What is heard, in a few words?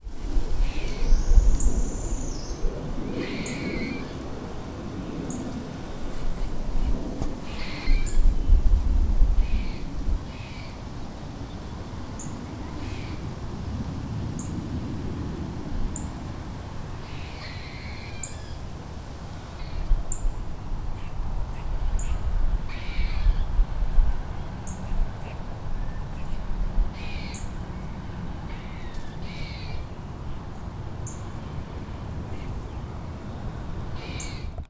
ambience; Ninja Jump and run